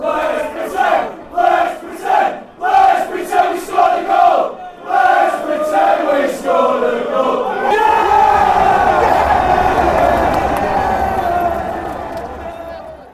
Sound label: lets pretened weve scored a goal, fan chants